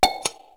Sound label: Shutter; LG KU3800 Bubble Pop; cam